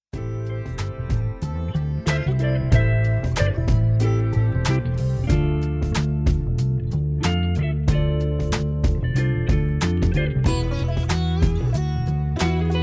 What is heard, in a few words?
word pop